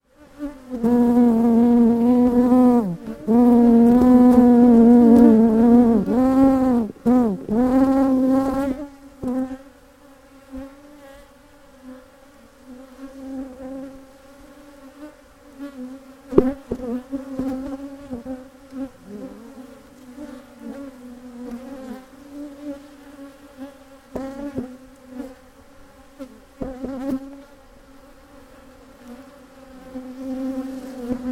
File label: bees